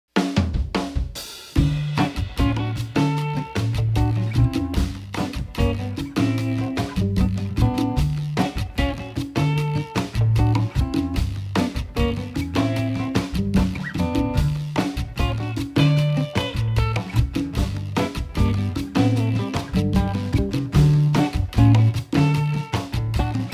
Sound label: Cicada